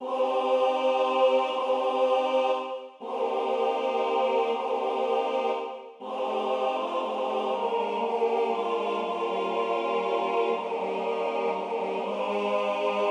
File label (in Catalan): Tots